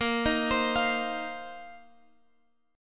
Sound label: A sharp Suspended 2nd Suspended 4th A sharp, Guitar, Open G